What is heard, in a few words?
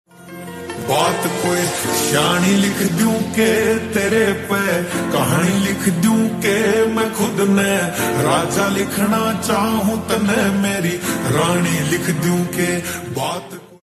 slow reverb mobile phone ringtone love lofi ringtone